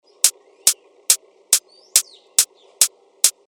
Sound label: 140 BPM, techno top loops; Titmouse; Revealed Techno Top Loops Vol. 8